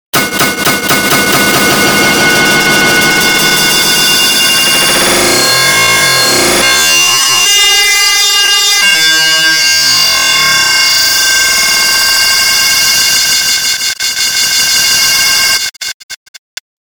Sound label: Metal pipe